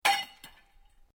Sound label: METAL PIPE FALL; Sound Effects:Materials; Foundation3